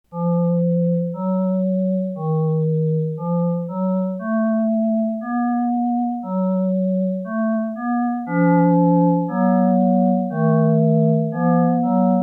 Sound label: Pierce Scale Examples